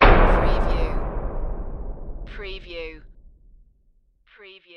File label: Hit Low